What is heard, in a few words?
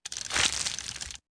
factory